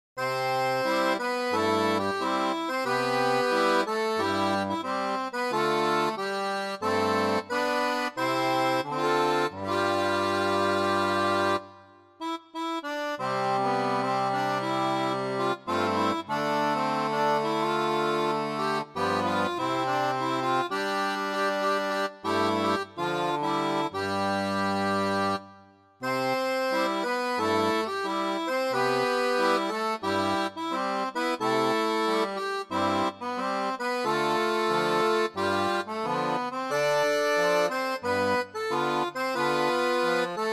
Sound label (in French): orgue barbarie